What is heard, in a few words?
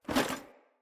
Foley Action Armour Melee Swipe; Player; Deora Armour